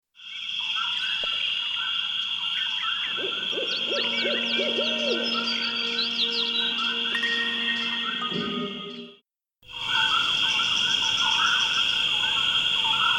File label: Jungle ambience